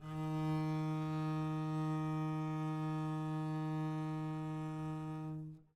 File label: eb3 down, bass arco; meatbass